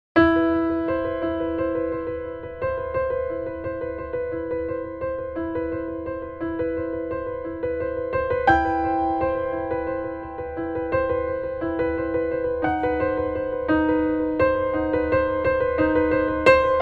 PIANO

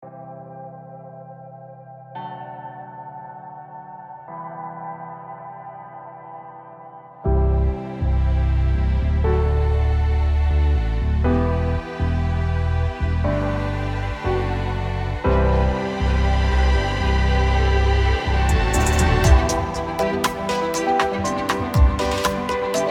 ELECTRO

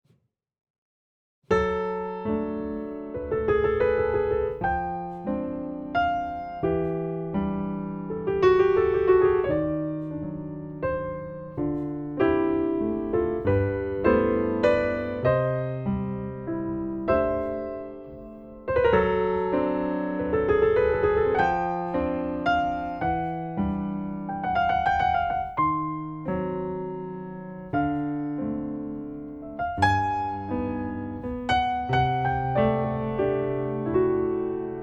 Waltz PIANO

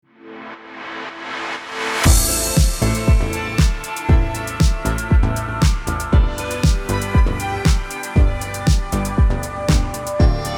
RETRO KITSCH New wavy

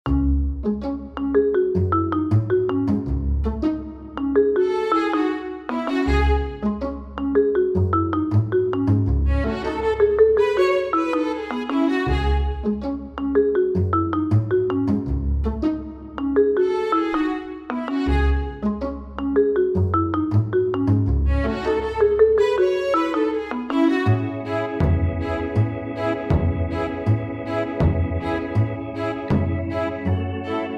ORCHESTRAL

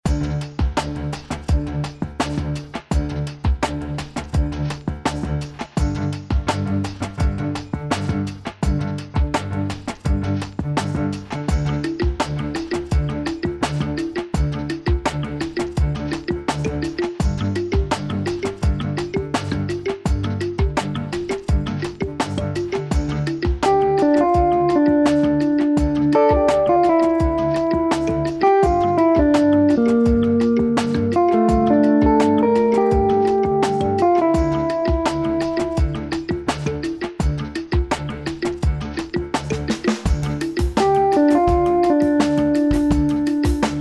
SUSPENSE Stakato

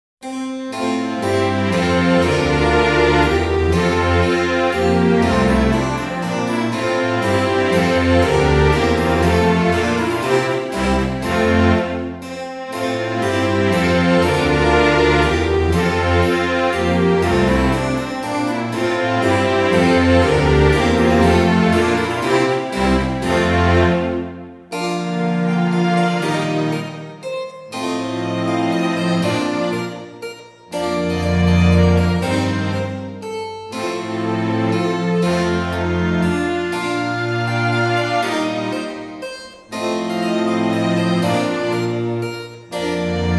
ORCHESTRAL

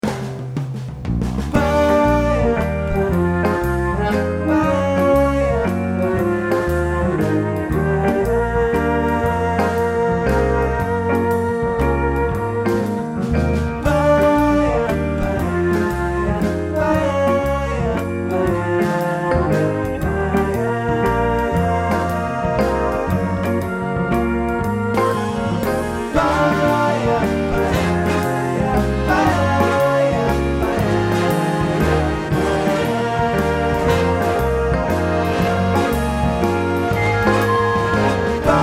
Jazz Moment

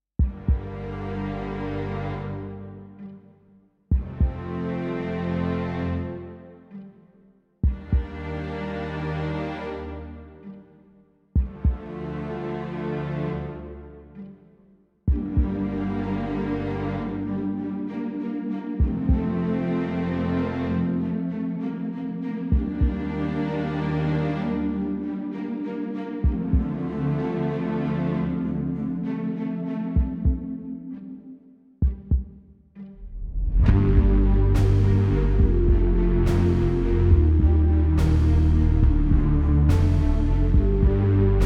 ORCHESTRAL Strings feeling

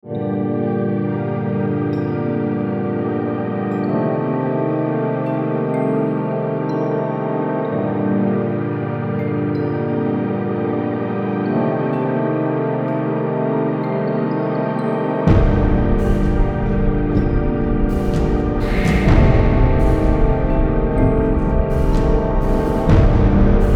DARK Ambient sounds

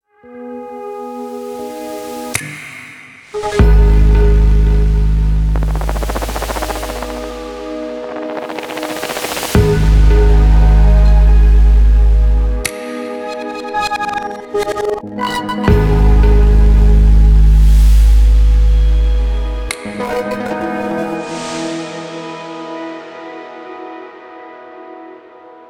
SUSPENSE TENSION

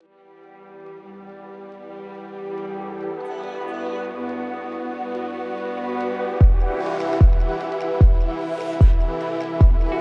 VIBES

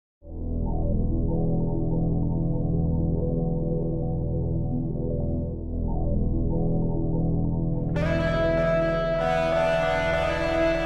Western Guitar

